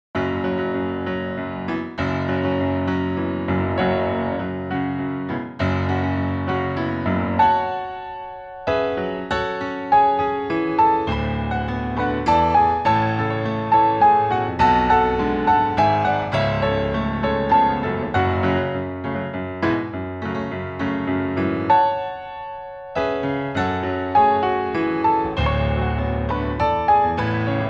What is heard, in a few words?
Contemporary Triplets, ballet